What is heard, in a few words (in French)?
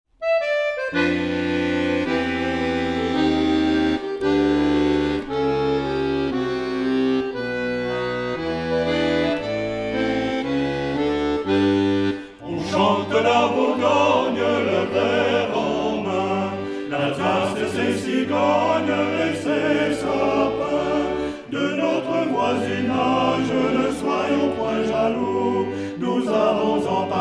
Marche Populaire Comptoise